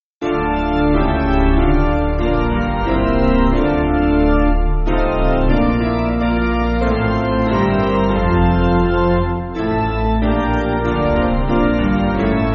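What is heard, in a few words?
Piano, Music Backing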